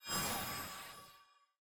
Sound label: draft ban button hover; champ select